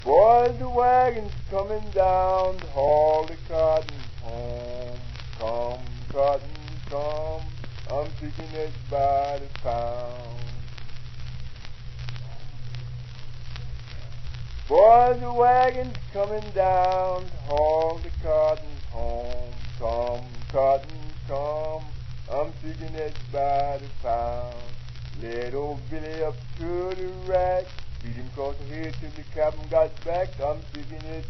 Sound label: Cotton Picking song